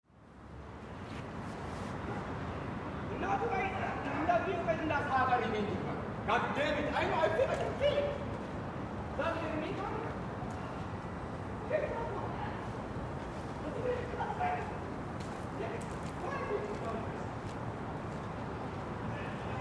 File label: Portland Soundscapes Old Town; mad composer lab